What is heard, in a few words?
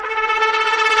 trumpet danger; music